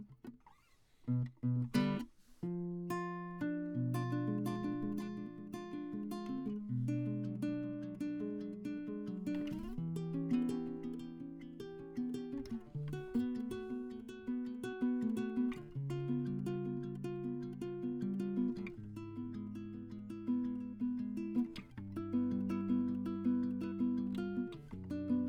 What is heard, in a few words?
Classic Guitar